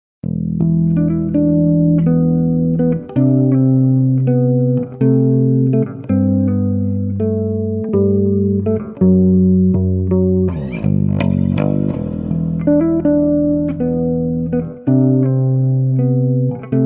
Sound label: Zombie Bass